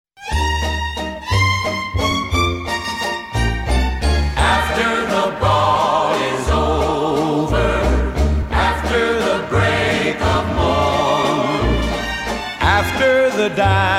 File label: Viennese Waltz; Венский вальс; бальные танцы